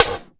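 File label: snare drum, effected drum; Crypton - DrumBase5000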